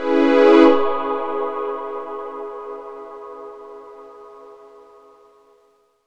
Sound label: C#m7, Chords; Electronisounds